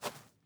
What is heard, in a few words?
footsteps GRASS; PC Building Simulator